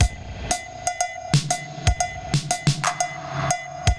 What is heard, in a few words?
Spring Verb Compressed TR 505 120BPM